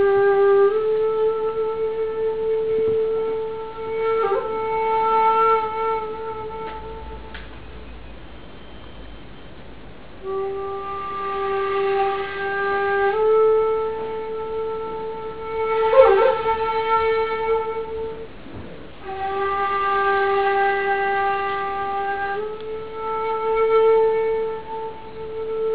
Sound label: flute player, Japan; People; Asia